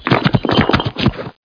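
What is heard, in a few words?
STONE BREAK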